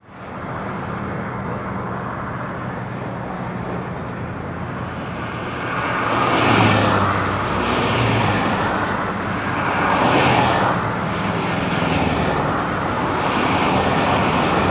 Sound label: Ambience - Traffic